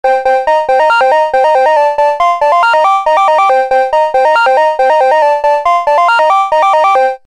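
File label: melodic bell, synth; mfb synthII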